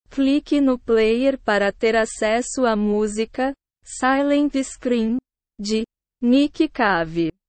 silent scream

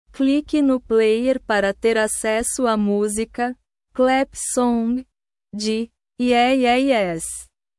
clap song

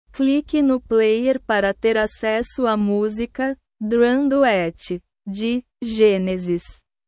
drum duet

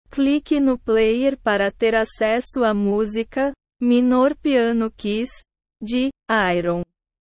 minor piano keys

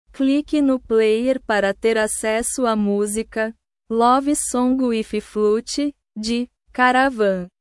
love song with flute

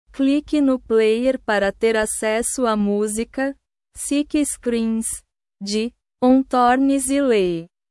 sick screams

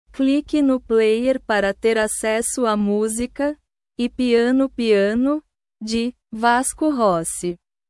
e piano piano

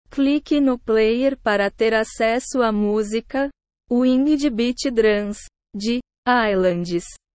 winged beat drums

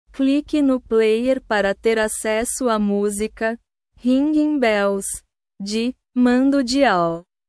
ringing bells

